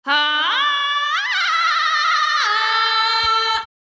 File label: tarzan yell; candace